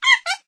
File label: monkey; rage of the seas